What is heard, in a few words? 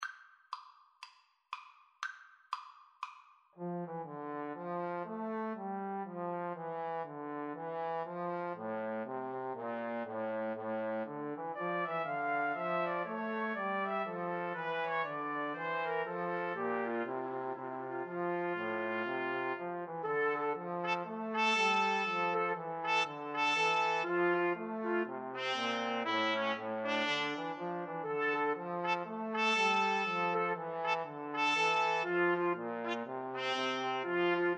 2 Trumpets 1 Trombone; bach bouree